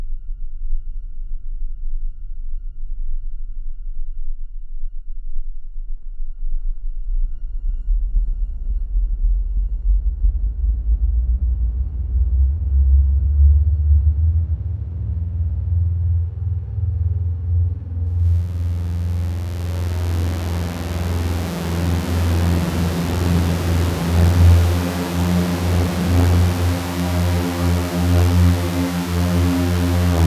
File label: Swarmator Solo